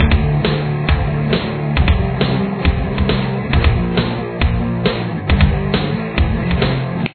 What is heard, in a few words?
guitar